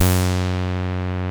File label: TEISCO, SEQ-FX; Masterbits; Vintage Voltage